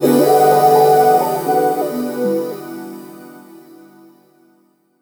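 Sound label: deep house FX, 125 bpm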